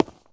Footstep Concrete Run; Legend of Keepers

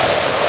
Winter games; Sfx Wintergames

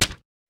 Toaster; Rabbids Multiverse

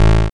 blip negative

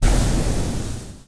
Radiance Laser Burst

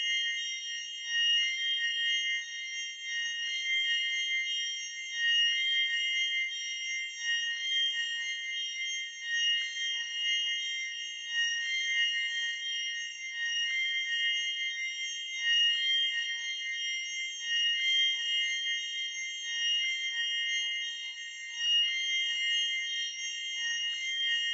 New playful

